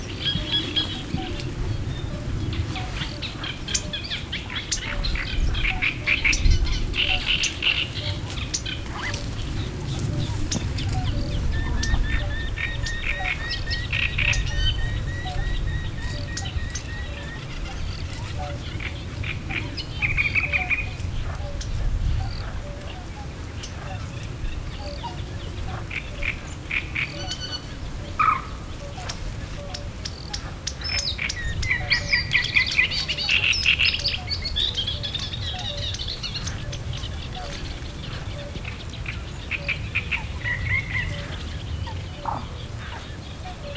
változatos szél, Hortobágyi halastavak; Kondástó

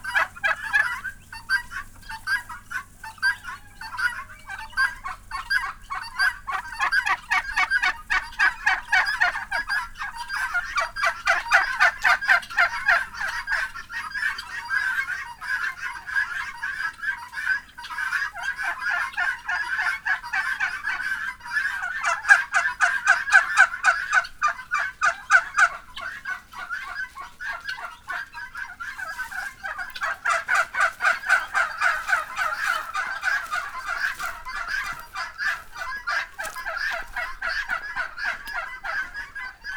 gyöngytyúkok; szolnok; parasztudvar

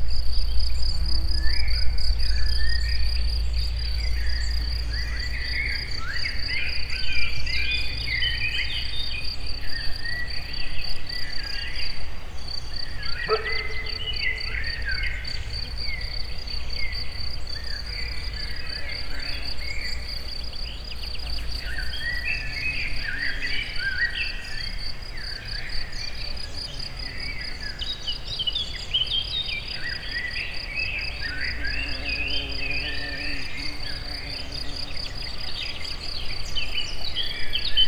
kutya is, rovarok; termeszetben; egerturistahaz

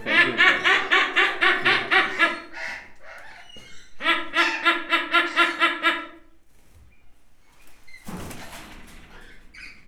röviden, trombitás szarvascsőrű; debreceni zoo 2012

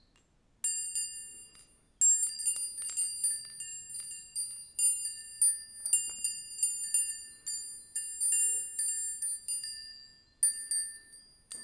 szelharfa; perkupa

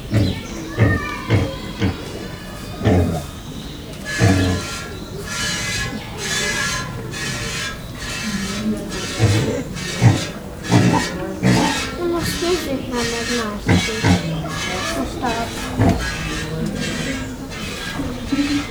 fejik a kecskét mangalica; szegedi zoo 2011

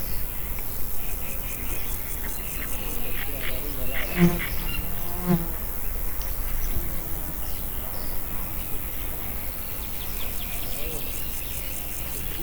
két nagy hangú, rovarok; termeszetben; tiszababolna

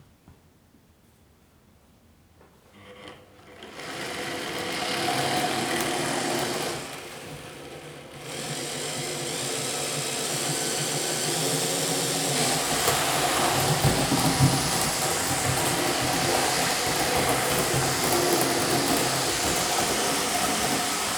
gyorsan halad kisiklik, modellvasút h0; perkupa

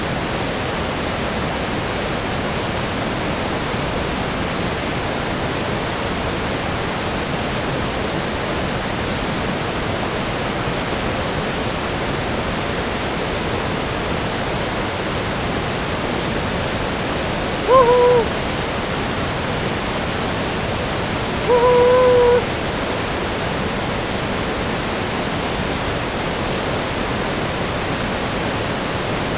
gleccserfolyó hangjelzés; chaladi

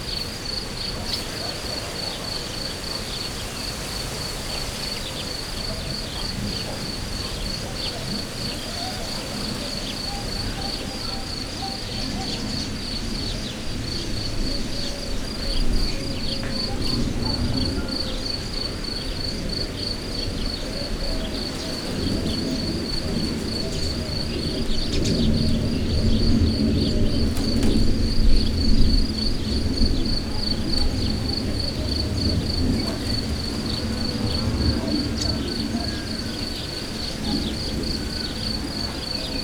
elhaladó repülő fecskék hortobágy; allathangok; termeszetben; tucsok

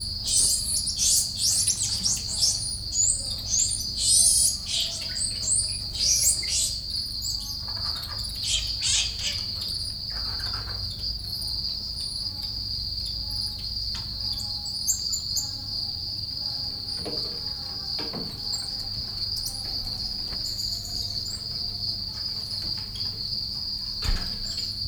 erosebb csipogo hang hangos hazi tucskok, aranykezu tamarin; debreceni zoo 2012; marantz puska

